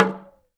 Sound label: TALK DRM, Heart of Africa; Spectrasonics